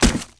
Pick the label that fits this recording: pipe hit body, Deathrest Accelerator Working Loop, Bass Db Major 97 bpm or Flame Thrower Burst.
pipe hit body